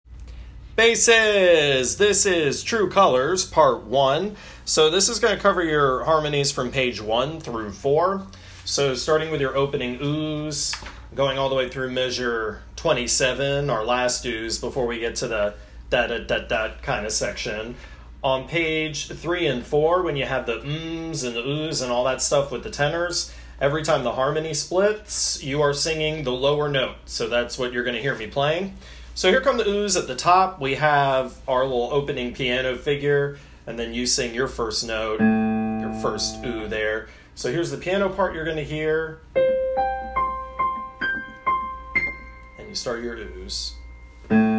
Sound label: Bass